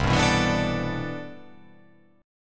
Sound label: C Major 13th C